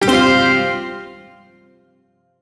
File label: Shawzin Pent Min Chord G; Warframe; Duviri